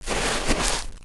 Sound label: Walking Dead Move Sound